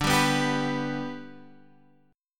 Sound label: D 6th Suspended 4th D, chord; D6sus4 strummed